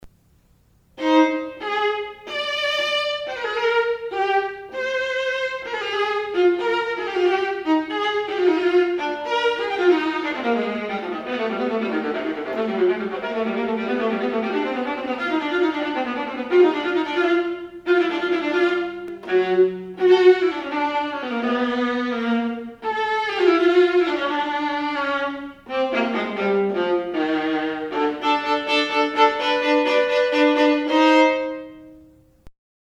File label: viola; Brahms